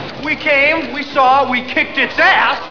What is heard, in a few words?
kicked